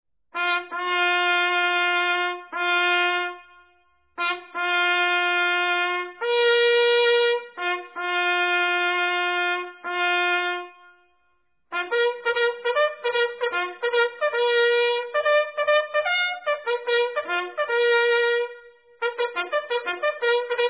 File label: a long reveille